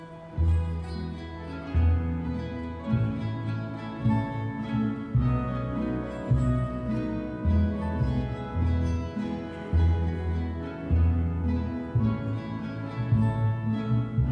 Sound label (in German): Zwischengesang, chor; Erntedank 2025